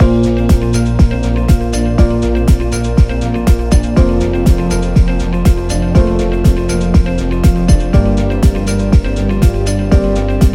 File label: Education Loop